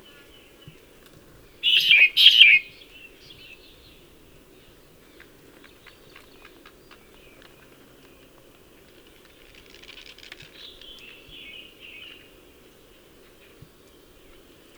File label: Darwins medium ground finch song; Darwins finches